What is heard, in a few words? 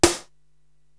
SD Dance, Korg TR-Rack drum machine